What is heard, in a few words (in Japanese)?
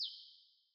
hiyoko